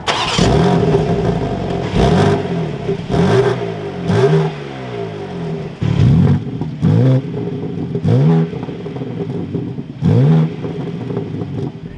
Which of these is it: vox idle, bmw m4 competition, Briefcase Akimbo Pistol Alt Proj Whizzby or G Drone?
bmw m4 competition